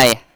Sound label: Beatbox Mixed, Human Beatbox; Vengeance Samples; Special Sounds